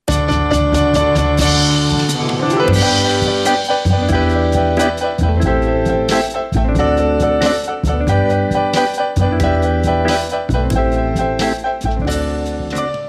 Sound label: radio jingle; radionest